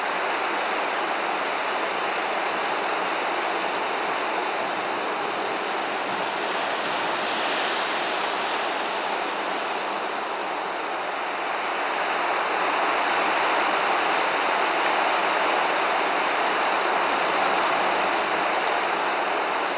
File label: environment tree leaves